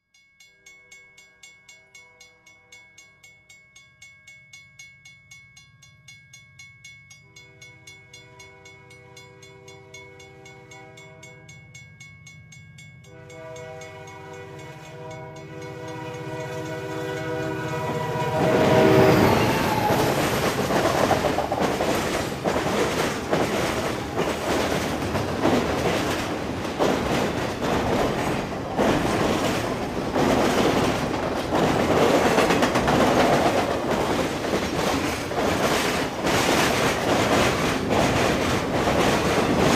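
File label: sounds of railway crossing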